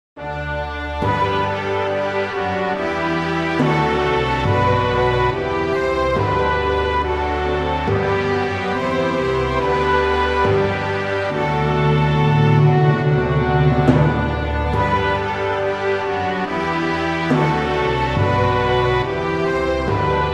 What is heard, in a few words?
Anthem